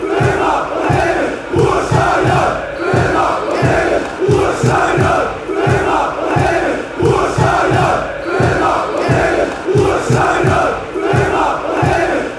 ruhr stadium fan chants

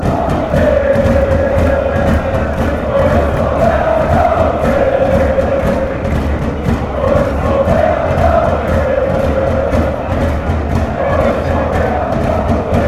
allez oh, fanchants; sv werder

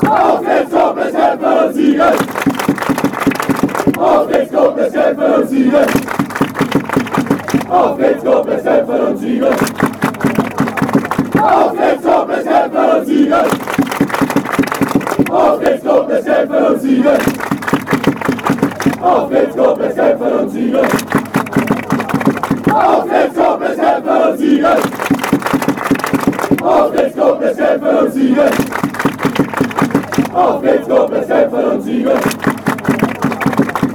come fight and win, fanchants; koblenz

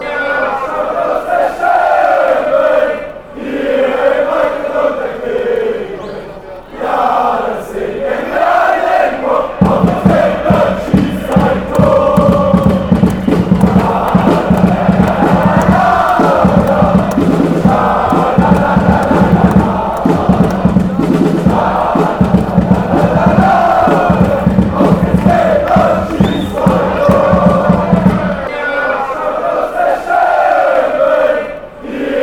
a goal, fanchants

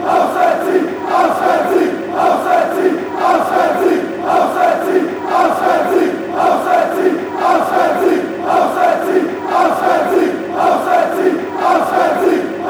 away victory, fanchants

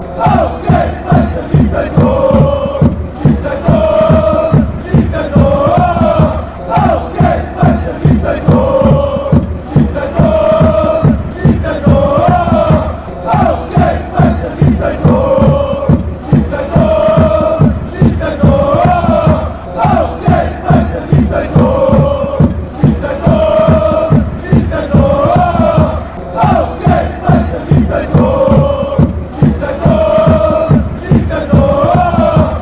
shoot goal, fanchants

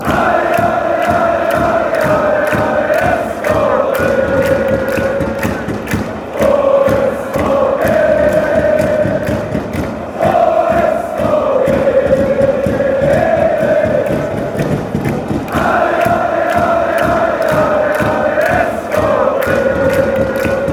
allez, fanchants; svw

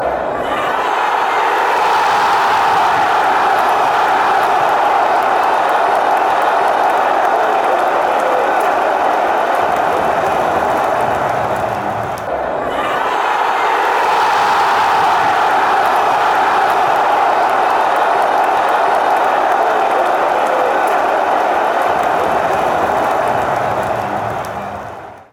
goal, fanchants

